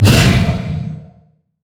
black shield; LoL; morgana; fallenangel

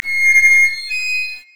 brazier chains groan

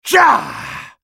spellcast effort; Talon; en US

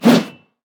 darius basic attack

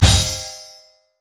valor basic attack; LoL; sona

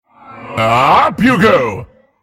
spell cast effort, en US; dandychogath; Character Voices - English

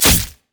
hiddenblade; Zed

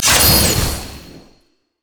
yasuo skin sheath spark; LoL; yasuo skin01

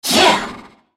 effort; FizzSkin04

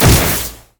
virulent slash charged; LoL; skarner

